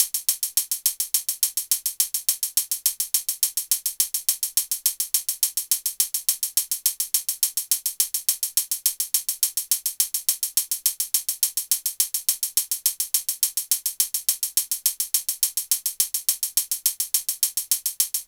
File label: hat 105, Hat Loops; Drum Loops; SD MIDNIGHT Sample Pack; Slate